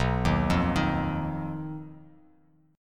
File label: B Major Flat 5th B Bass Standard; BMb5; arpeggiated; 7,6,3,8